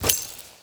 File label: grenade Throw; Weapon Package FortWars